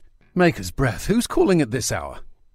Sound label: breath call